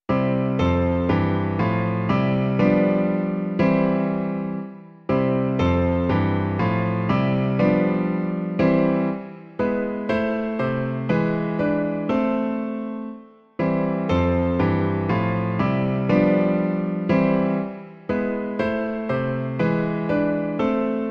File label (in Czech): klavír; Ó požehnej nám, Pane; Klavírní verze